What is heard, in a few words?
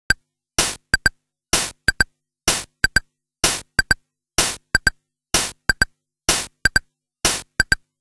CASIO VL-1 SWING